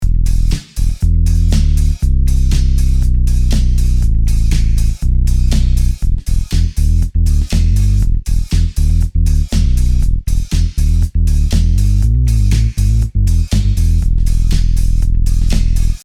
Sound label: Filter